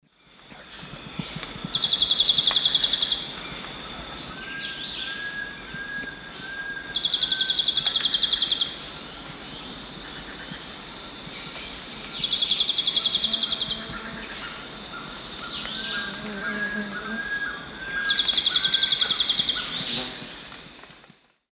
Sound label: Polioptila plumbea, song; S. America; Eastern Lowlands